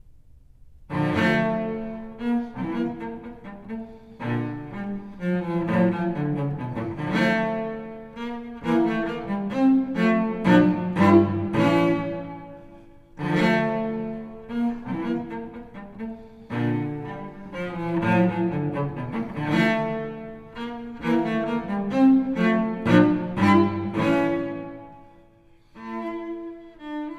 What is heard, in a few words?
Menuet, Suite No. 2 in D minor; BWV1008